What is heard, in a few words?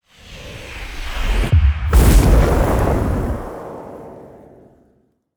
fire explosion